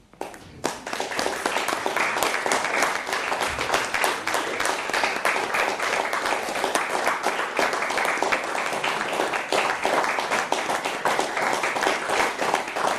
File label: Polite Applause